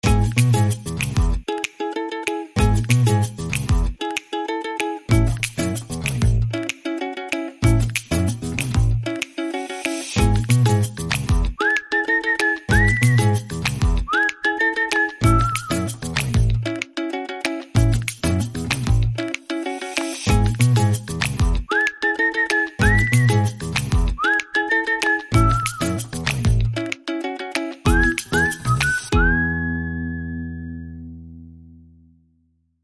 Whistle a Tune

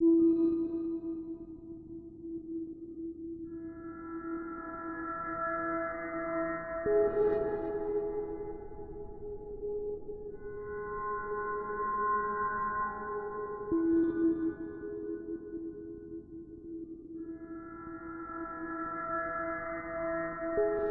Drone, Light

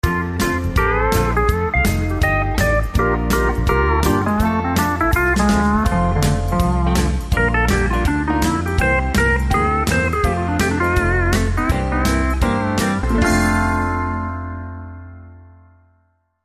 Nashville Two Step

